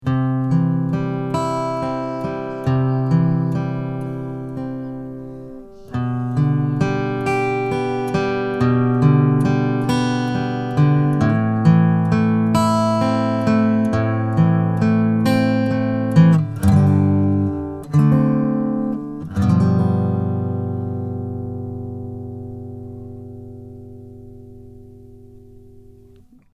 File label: Guitar Ballad Intro